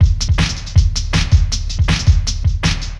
DRUMLOOP D+B 160, Drum+Bass Loop; eJay

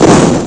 bomb explode; napalm bomber 3D

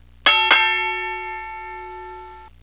midbell

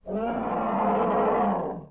dragon; war3demo

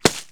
wet hud

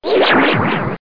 warp

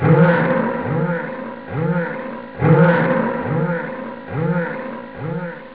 echo

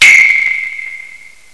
err

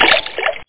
water; Internet 1996 World Exposition; Japan; NTT

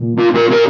guit; Shogo; Music

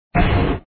helix explode; operation graduates

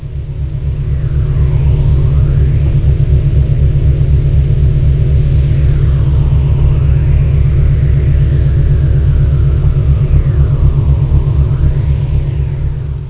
HALLWAY

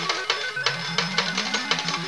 roulette

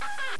CREAK; Image Bonanza; Expert Software; follies

